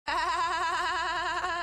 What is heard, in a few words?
laugh